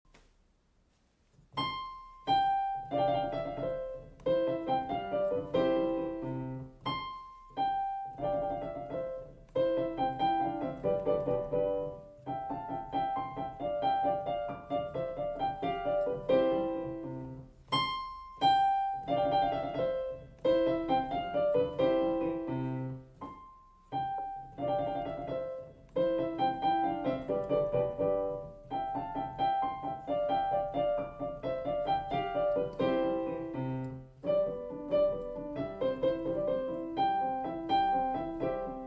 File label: gigue ornamented version; duncombe william; the hunt